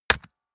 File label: gun shot silencer wrist; schusswaffen